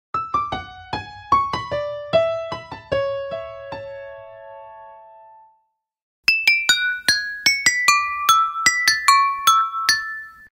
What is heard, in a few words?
nokia ringtone piano vs glass